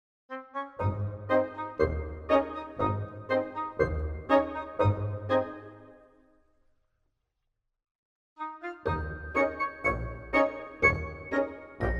Orch Writing

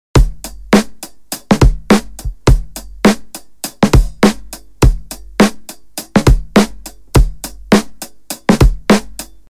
103 loop

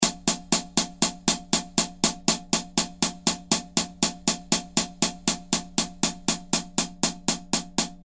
120 hat big eighths

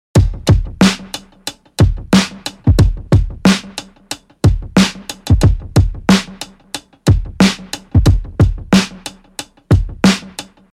91 TAPE ECHO DRUM LOOP SIMPLE TAPPER

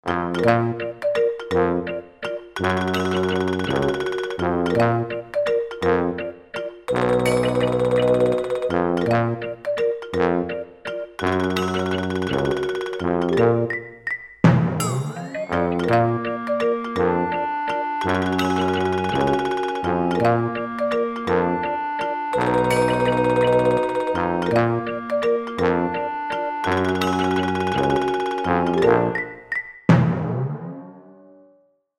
Valse Humor Timp End

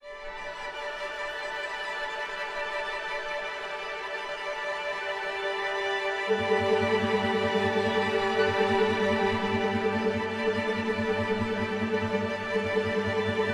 Spring

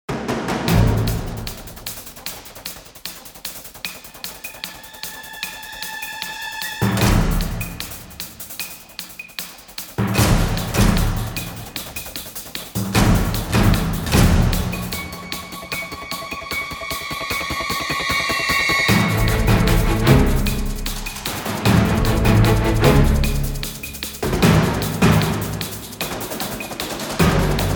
Disco Party Fight

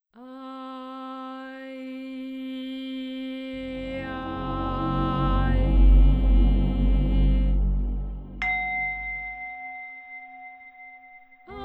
fire hymn

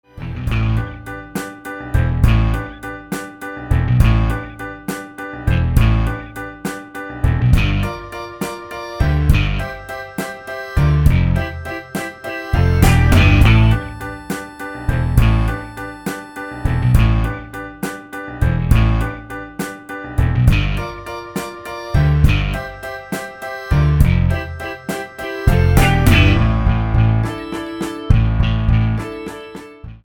(Instrumental)

